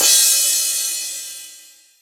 long tail reverb urban crash cymbal sound a sharp key